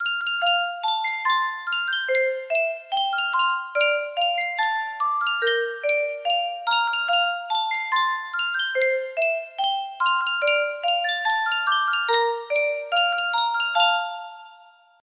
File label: yunsheng music box, melody